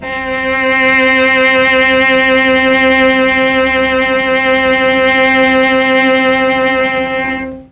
C3 300 cello, mellotron strings

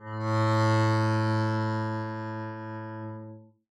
arco a2 mf down, double bass; dsmolken

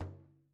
Hit Muted, Frame Drum; Membranophones; Struck Membranophones

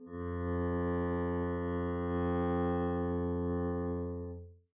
arco f2 p up, double bass; dsmolken

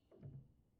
release C7, Upright Piano; Chordophones; Knight; Releases

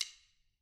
Snare stick Mid, Snare Drum, Modern; Struck Membranophones; Modern 3

